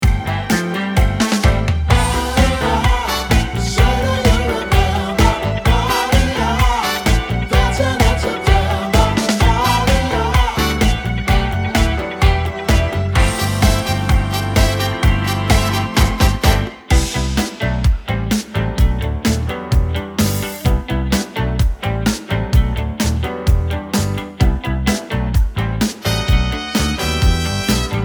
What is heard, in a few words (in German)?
G ohne PIano